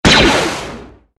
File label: shoot rifle blaster